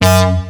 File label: Lead Stab; STMPD